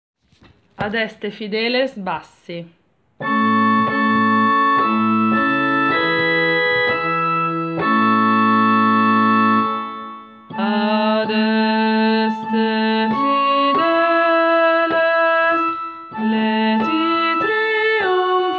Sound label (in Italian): bassi; Adeste Fideles